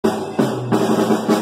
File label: drums